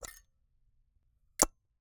Camera, Argus, aluminum, steel, professional, fully mechanical, film compartment, open close; Eiravaein Works; Vaeyan IV